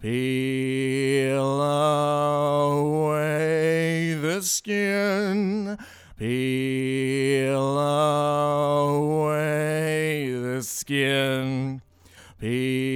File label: vocal